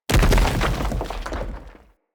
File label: Stag Gallop Charge Vegetation, Footsteps; Animals; Foley